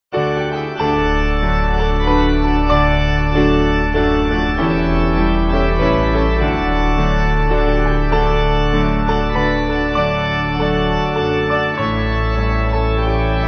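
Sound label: Piano Organ, backing; I Will Sing The Wondrous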